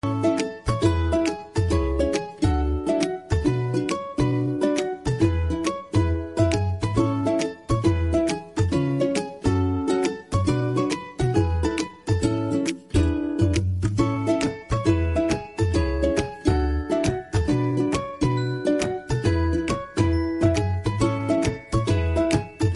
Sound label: game BGM